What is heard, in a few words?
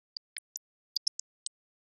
denoising glock 0dB mix